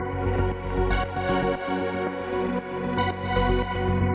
Music Congratulations; sayonara wild hearts 2019 gamerip

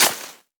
Heldbeat Start Fuse

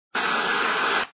Static tv; Fernanfloo Saw Game Online

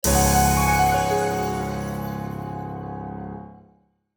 click; Lanota; Android

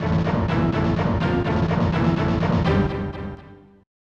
Unused Jingle; Alien 3; SNES

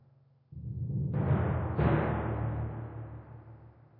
Tymp

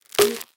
Gift Drop; Candy Crush Soda

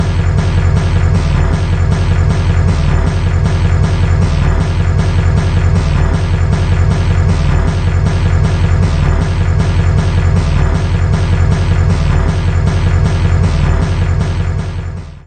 Big Drums; Attack From Mars; Bally; pinball

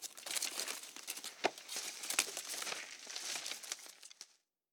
Bushes; We Are the Dwarves

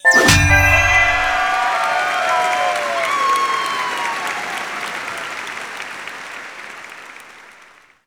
Jingle, Gold Cup; rayman legends the complete soundtrack gamerip 2013

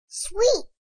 exclaim; Chibi Knight

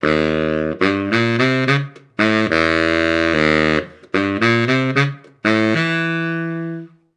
Guit; Bart

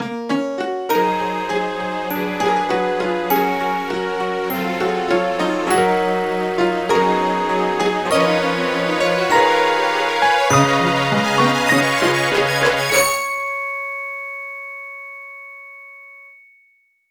Startup; Shrek Ogres & Dronkeys; NDS

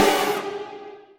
Combo Hit; Bee Movie Game; Trapped - Ken Combo 1 Hit

